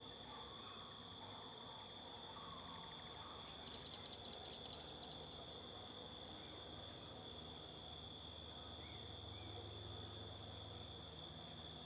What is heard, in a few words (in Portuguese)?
Passaro canta agudo ambiente rural com cigarras, cachorro, transito longe; aves